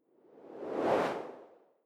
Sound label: whoosh simple incoming; swooshes-swishes